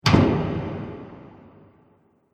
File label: Haunted House Door Shut; Creepy Door